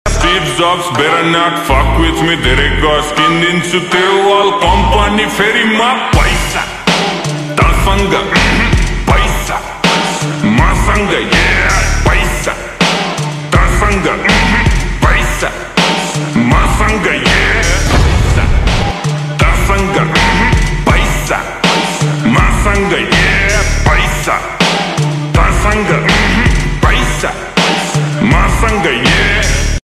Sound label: slowed reverb ringtone